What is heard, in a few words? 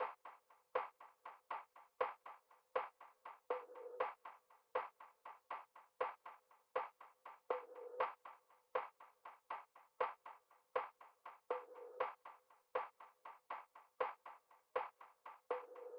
Drum Loop, 120 BPM Percussion; Cymatics; Vibes II Drum Loop Collection; Bright Drum Loop